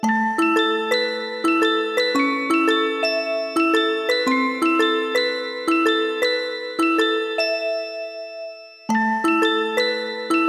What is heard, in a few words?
Pause Menu